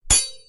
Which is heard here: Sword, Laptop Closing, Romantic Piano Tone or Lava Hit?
Sword